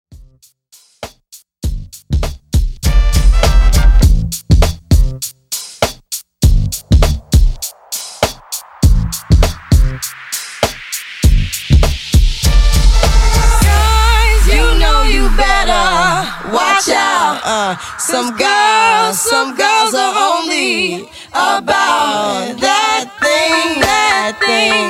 Doo Wop

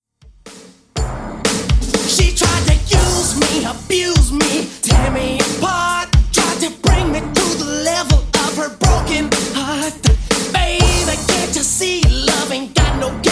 90s Redrum 122bpm

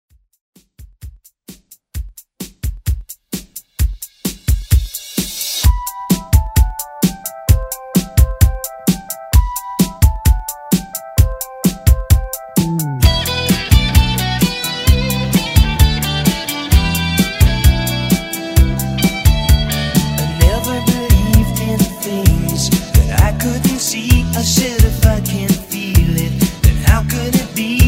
80s Rock

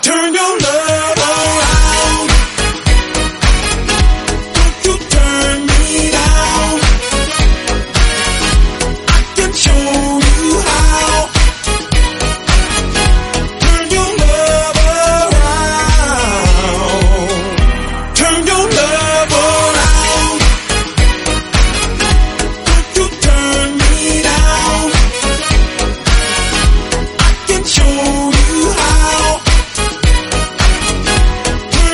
Snippet 106bpm Ebm

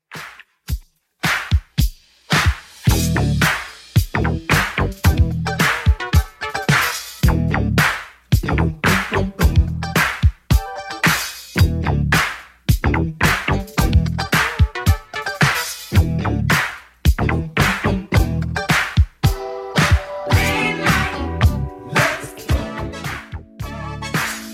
80s Redrum, 110 bpm

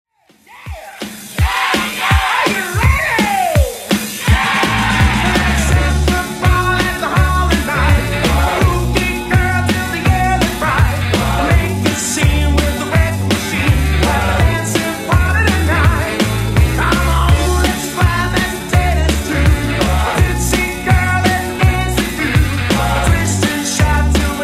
70s, 166bpm; Redrum

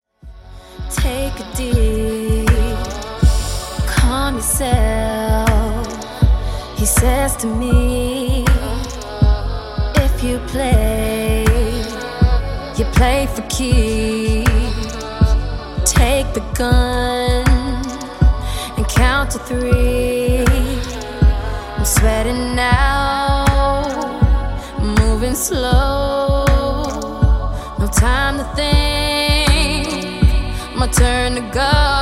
Funk Intro Outro 80Bpm

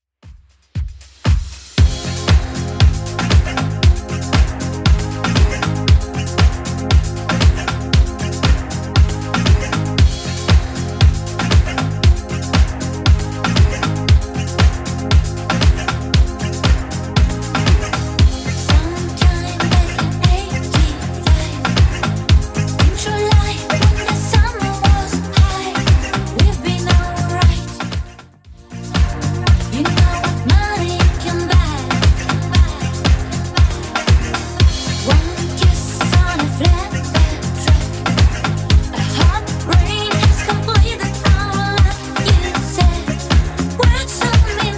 80s club 117 bpm clean; 80s Club Edit

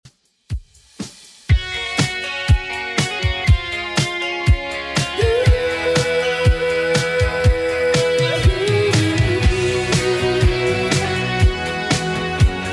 80s Redrum 121bpm

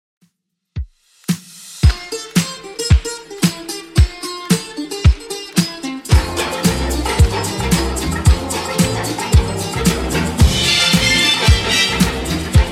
60s Redrum 112bpm; remixplanet

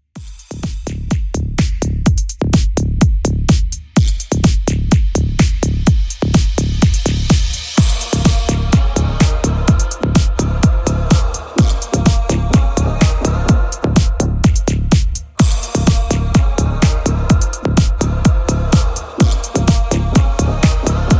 Dance House 126 Bpm

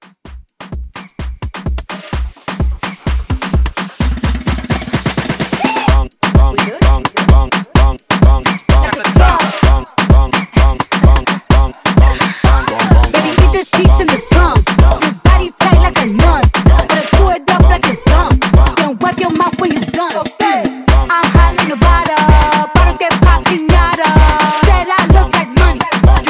Afrobeat Mixshow Re Drum

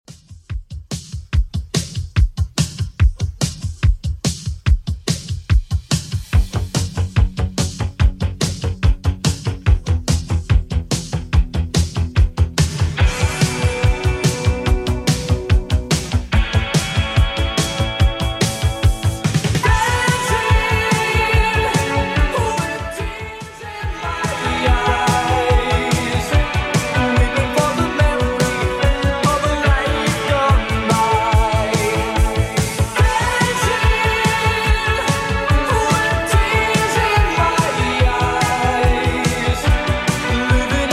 80s Redrum 144bpm

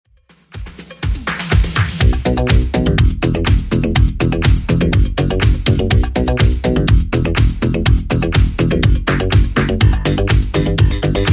80s Redrum 123 bpm

